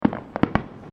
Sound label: Fireworks Multiple Crackles; Backgrounds; CraftedSignals